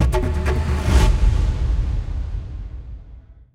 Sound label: free spin end; rise of maya